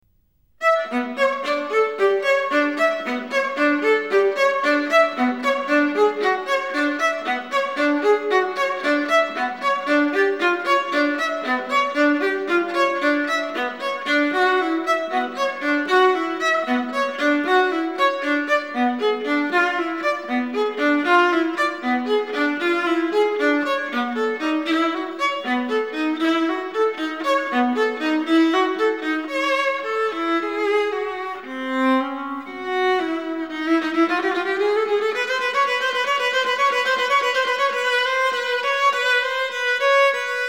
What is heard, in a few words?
viola khachaturian sonata